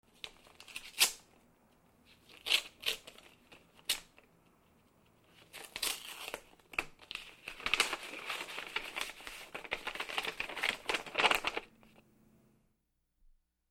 Sealed Envelope opening sequence

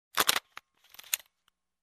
Bridge Camera Shutter

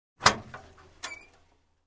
Opening Small steel container

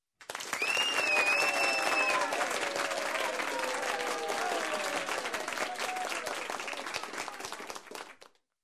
After Performance Cheers and Whistles

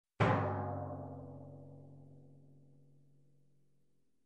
Single Deep Timpani Hit

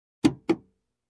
Opening compartment in car

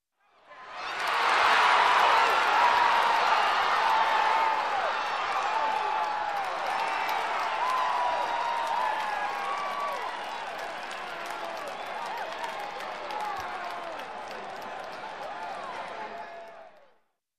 Crowd in a sports game cheering

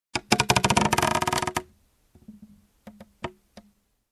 Deep Creaking

